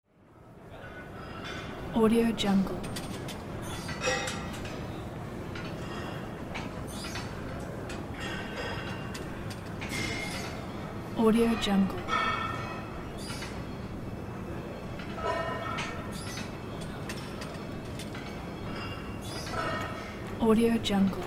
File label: gym ambience; satyrsound